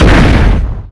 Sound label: explode ball; Tanks